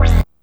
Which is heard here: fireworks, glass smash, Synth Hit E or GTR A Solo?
Synth Hit E